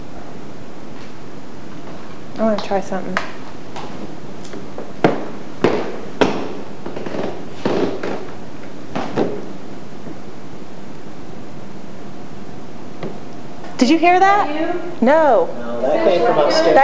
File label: stairs experiment knock